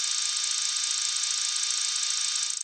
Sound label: process; Keebo